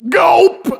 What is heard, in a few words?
Gulp